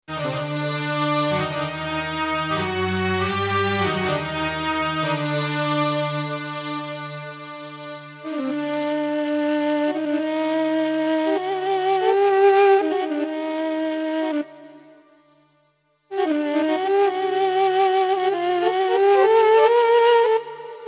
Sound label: SOLO